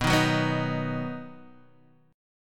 B Major B, Guitar, Half Step Down

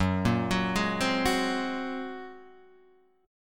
Bb 7th Suspended 2nd Sharp 5th Gb

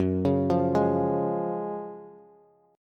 Gb Major 7th Suspended 2nd, Bass; GbM7sus2 arpeggiated; JGuitar

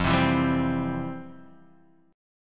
F Major 7th Suspended 2nd Guitar DADGAD; 3,3,2,0,3,x; down; chord shape

